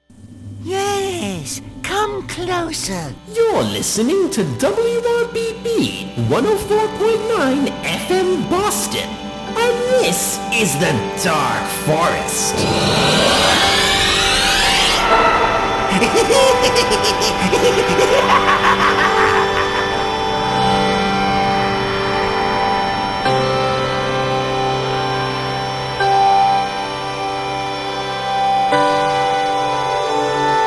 hyperpop

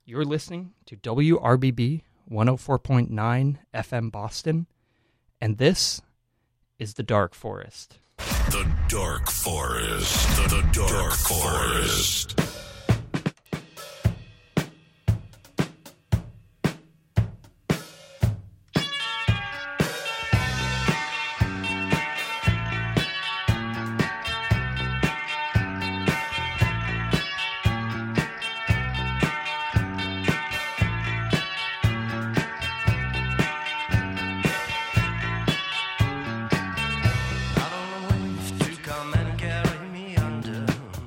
post-punk

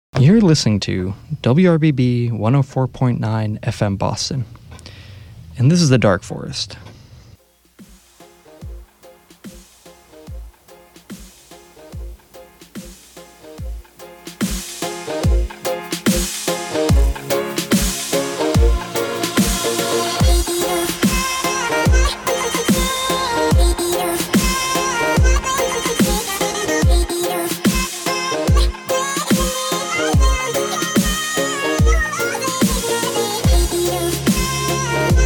brostep

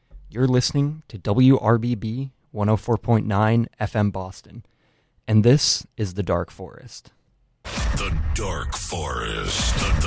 jazz rock

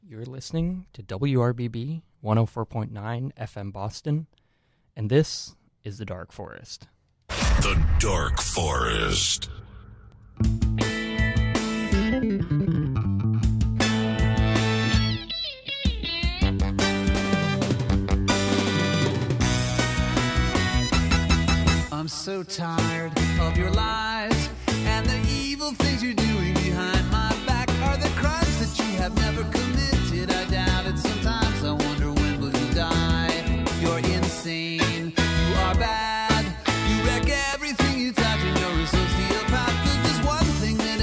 wonky rock

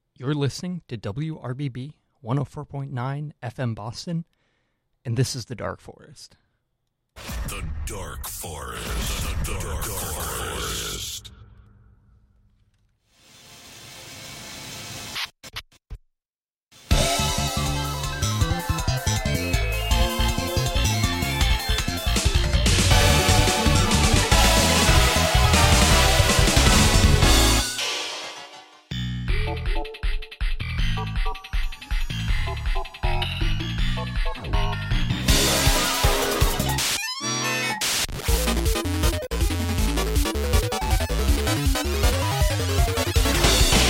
digital fusion

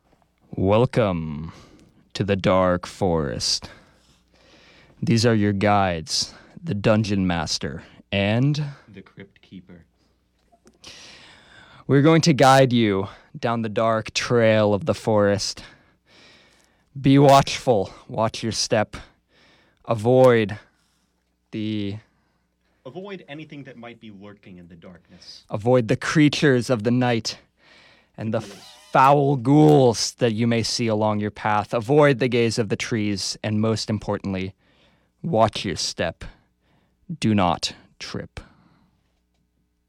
trip hop

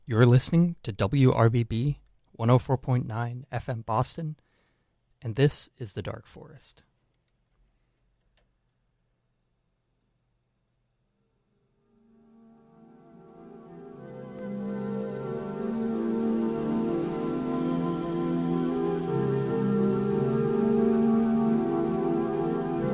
dungeon synth

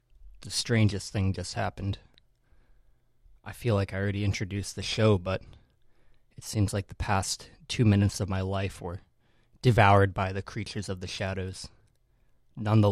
pop punk

